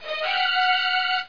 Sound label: gator; davidson